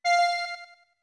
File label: Notes Music Box F med; OOT